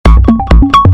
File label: Loop